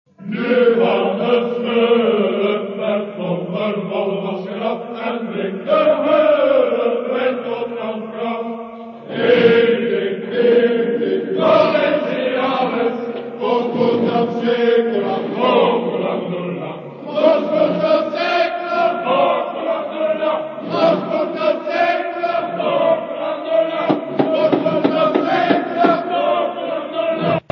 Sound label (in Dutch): Oud Tafellied; Folklore